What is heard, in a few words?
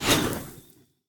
roman ranged bow projectile; smite; npc; roman archer